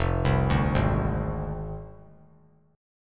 F Minor 6th F down; Fm6; arpeggiated; JGuitar

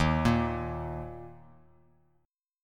D sharp 5th D sharp; arpeggiated; 11,13; JGuitar

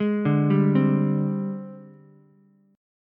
G sharp Major 7th Flat 5th Ukulele; 1,2,3,3; JGuitar

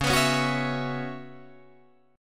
C sharp Minor 11th C sharp, Guitar Drop D

